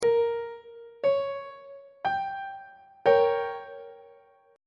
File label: f double sharp diminished 1st inversion on treble clef